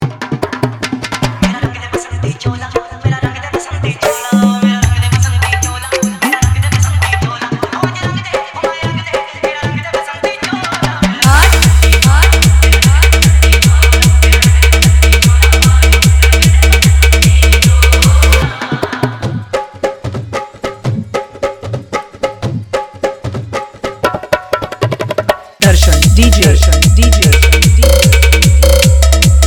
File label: Desh Bhakti Dj Remix Songs Edm Trance Mix